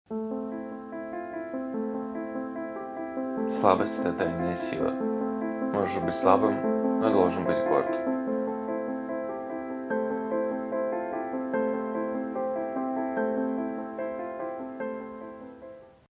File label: ringtone